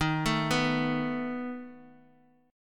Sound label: A Suspended 2nd Flat 5th D sharp, guitar